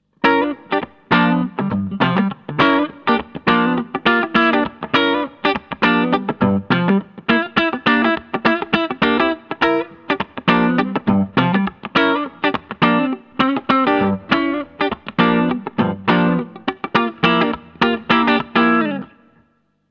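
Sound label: Humb 59 Les Paul neck funk; DrZ MAZ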